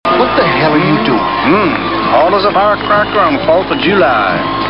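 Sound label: firecracker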